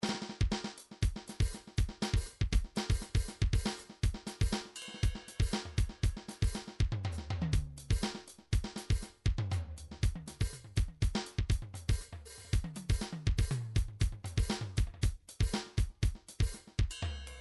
midi drums percussion fill; Midi Vol 4 Drums & Percussion

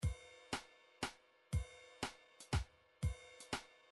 midi drums waltz

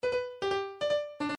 sms

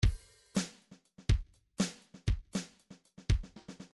midi file grooves; Bdf Midi File Grooves

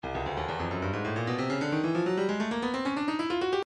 breakbeat 133 bpm; Stylus Breakbeat Midi Files 130 139 Bpm

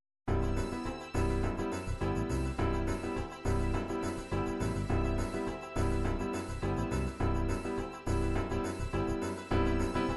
disco disco pop, midi

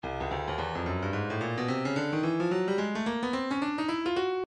breakbeat midi 109 bpm; soho f; Stylus Breakbeat Midi Files

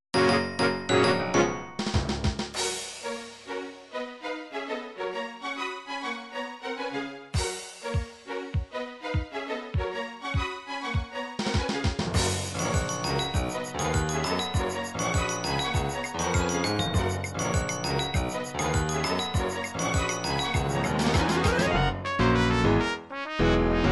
title theme orchestral remix; nintendo 64 super mario 64

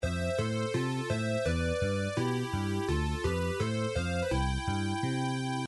game over; nintendo adventure island 2

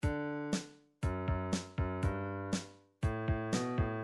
drums

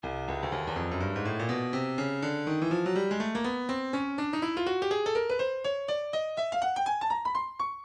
stylus breakbeat midi 123 bpm jive house

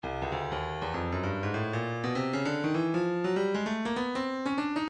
stylus breakbeat midi 099 bpm

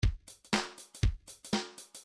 reggae 1bar

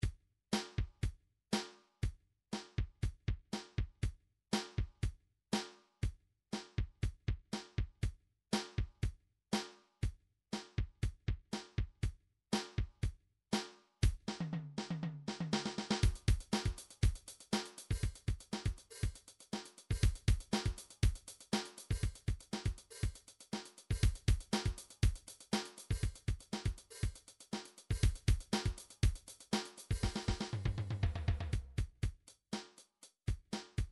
drums rock

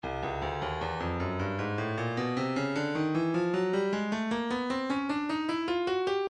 breakbeat 077 bpm; Stylus Breakbeat Midi Files; credo

